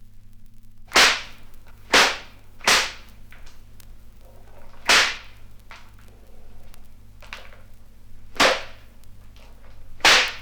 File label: bone twisted and hits horror